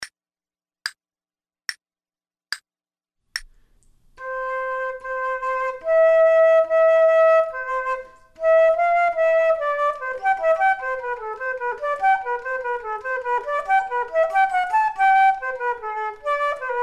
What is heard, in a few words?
Duets flute; Selected Duets for Flute Podcast